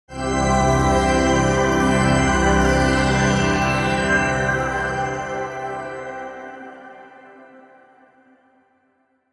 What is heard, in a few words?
Bright Angelic